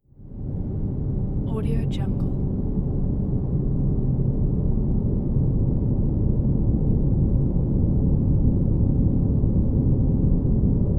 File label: ambience deep abyss